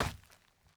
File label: dirt land; teardown; ps5 windows xbox series xs